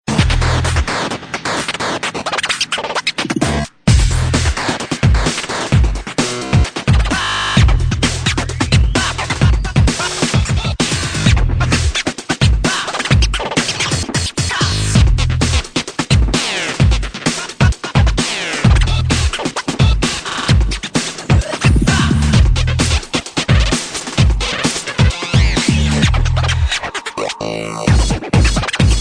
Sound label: Spin Screeching